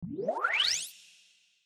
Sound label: Whooaaaaa